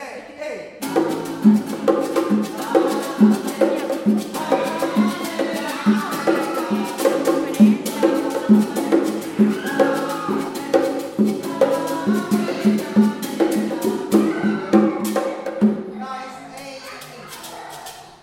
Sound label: amen